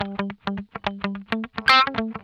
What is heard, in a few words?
HARD PICK, Funk Guitar; 90 s Sample CDs; Zero G